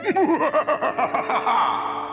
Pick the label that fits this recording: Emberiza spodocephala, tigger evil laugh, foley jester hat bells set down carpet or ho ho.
tigger evil laugh